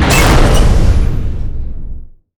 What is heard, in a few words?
crane stop